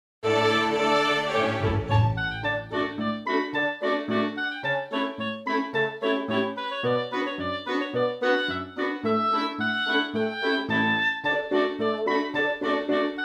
rabbit island

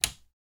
The sound of pressing an electric switch

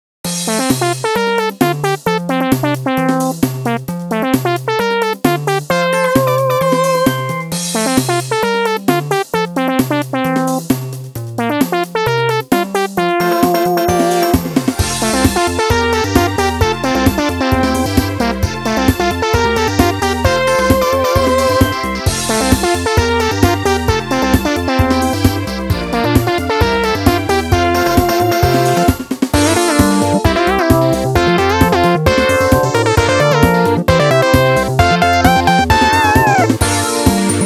fun game, bgm

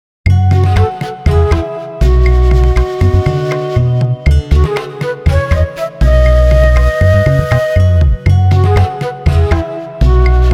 traditional village

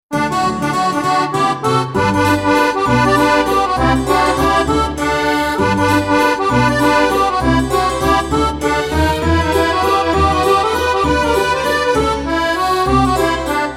Port town performer, bgm

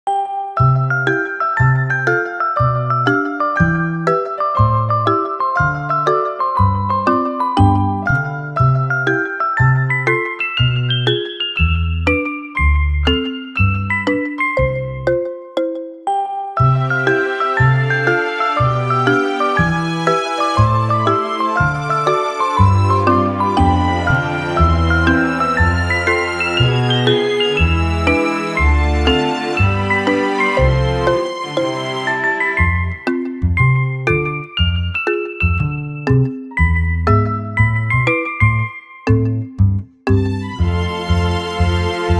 Wonderful everyday of animals, bgm

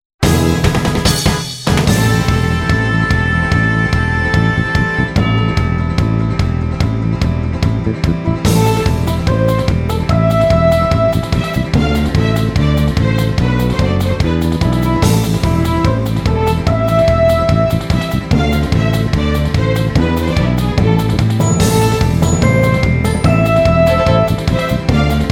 raging sand smoke

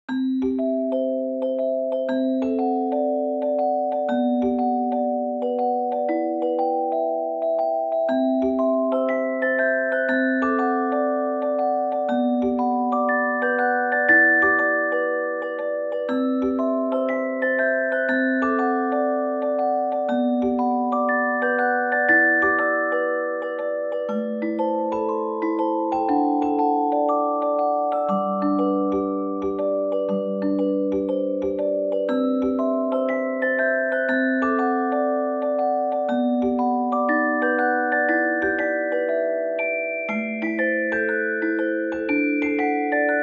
Fun village night, bgm

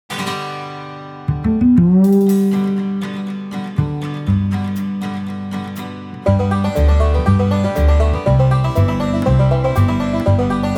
Summer sky beach

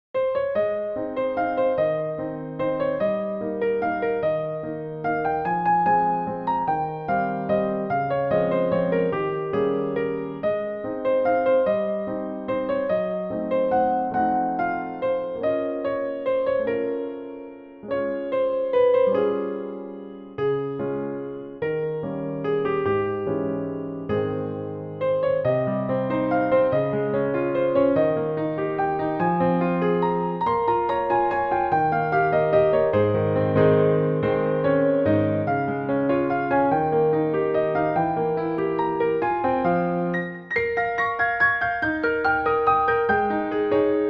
Preface, bgm

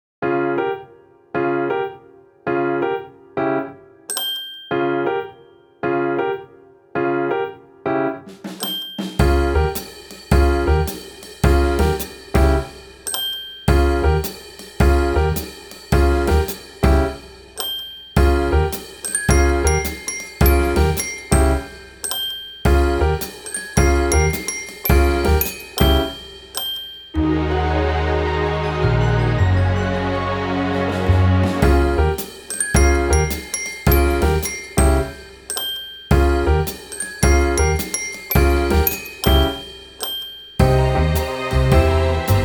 A little rich morning, bgm

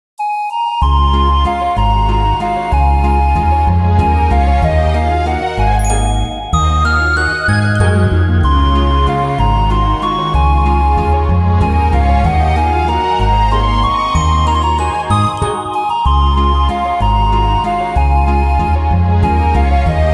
that girl i yearn for, bgm

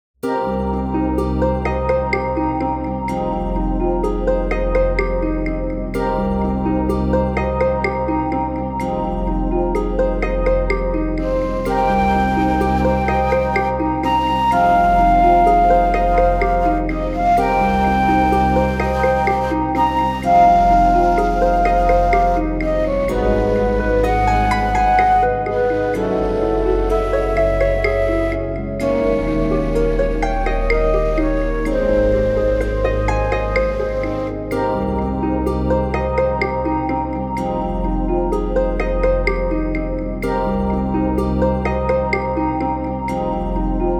fantastic forest, bgm

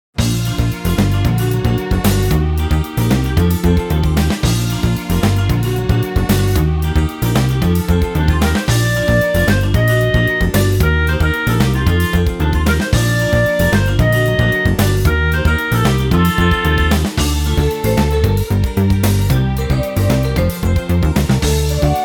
refreshing beach

